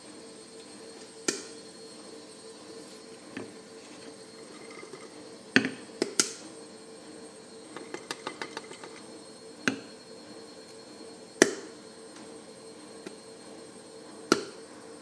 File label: popity pop